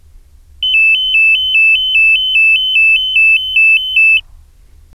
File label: piezo slow